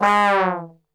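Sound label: G#1 trombone, Brass; Best Service; Brass Super Section; 90 s Sample CDs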